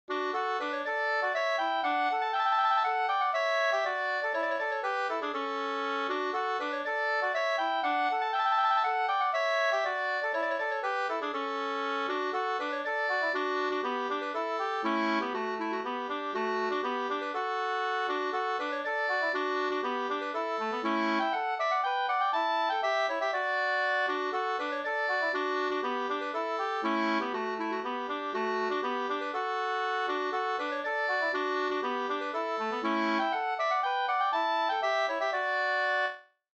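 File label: polska, two-part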